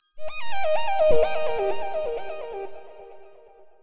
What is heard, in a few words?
Sms Ringtone; Infinix Hot 10